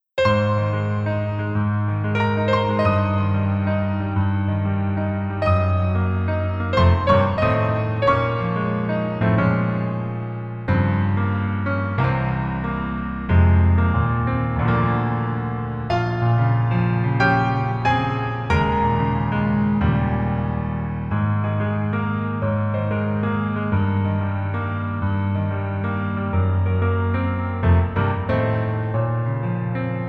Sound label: Piano